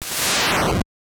Lightning

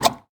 Kiosk Juice Squeeze

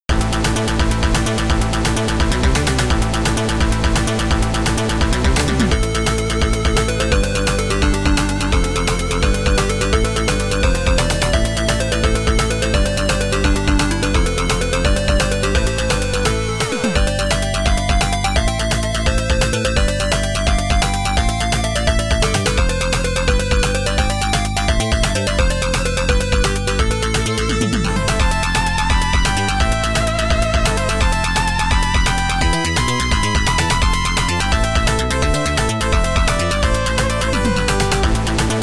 Underground Cave

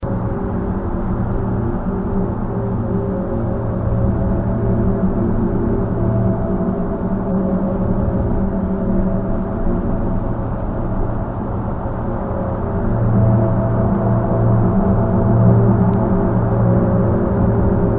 Ambiance; Verlies II Verlies Origins

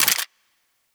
Weapon Foley

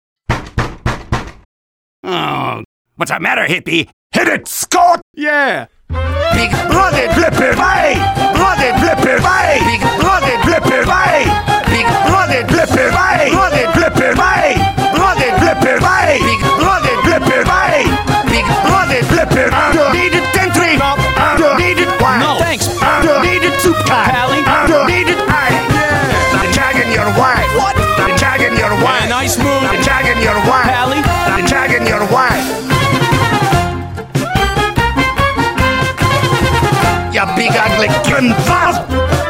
Bass Kick; Bassofsky Kick

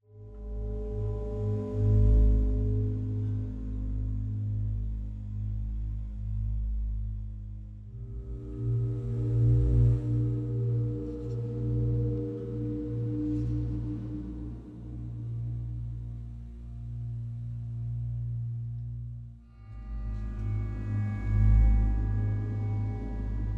Dark Scary Explore Mood

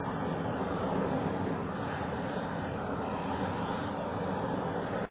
Boosting; papas cupcakeria online windows gamerip 2013

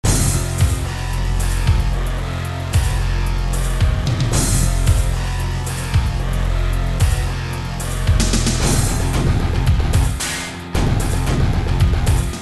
you lose; street fighter 2 ryu vs sagat

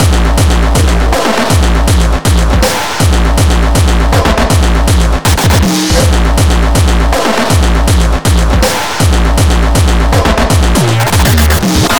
Beats Loop; Anger Foot

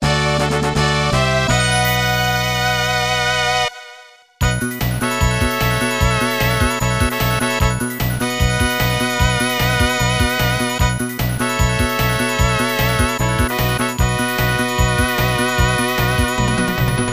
Battle Victory; Dual Orb 2; SNES

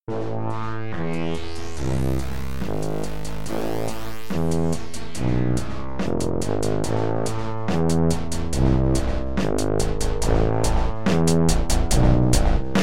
Brass; Harvest

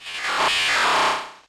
Techno Blast Electric; pokemon reborn e19 2022

